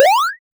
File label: 2d game jump sound; platformer